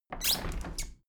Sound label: window handle plastic squeak open regular; objekte; fenster